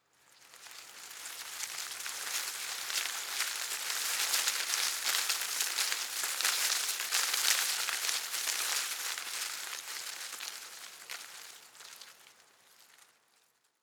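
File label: shrub small leaves rustle heavy, garden; haus-garten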